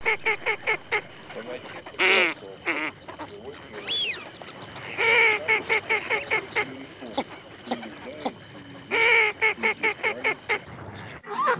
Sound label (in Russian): Широконоска Mestolone; plurifon